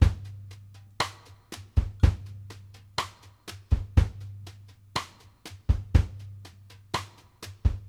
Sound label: STRGHT, BALLAD 60; Sampleheads; New York City Drumworks VOL-1; SP BALLAD 60